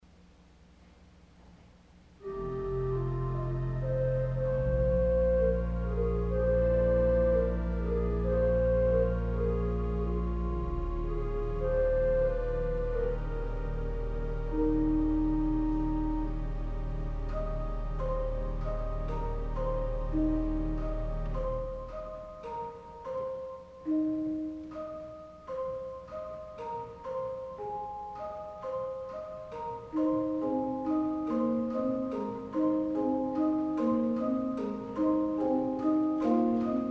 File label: Carillon Harp and Chimes, organ stops; Culver Academy